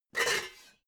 pots clank roomy

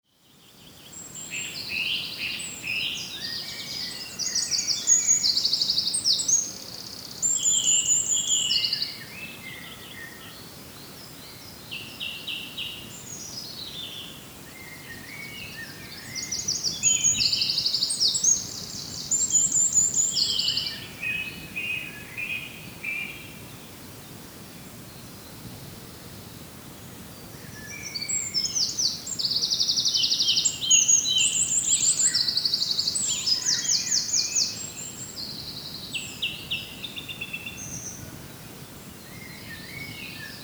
birds singing far sheeps

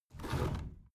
window roller shutter close soft single pull

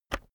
keyboard external enter push heavy

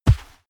horse step snow

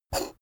poker chip flick slide table

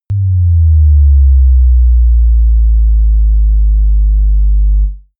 bass drop soft dirty

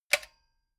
metal button stereo spring click fast

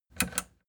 plastic button latch click disable fast

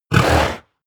horse vocal snort

